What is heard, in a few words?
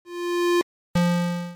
volume ramp up down